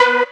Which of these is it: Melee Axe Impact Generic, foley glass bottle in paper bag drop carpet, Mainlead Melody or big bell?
Mainlead Melody